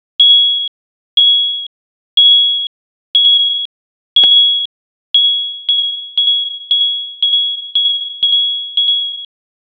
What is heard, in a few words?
a push notification alert